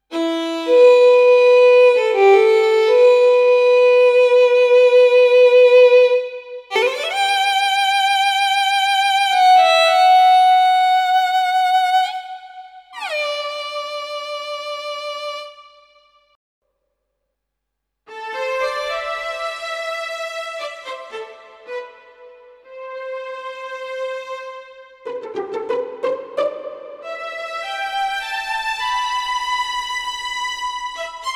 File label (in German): Korg PA4X Streicher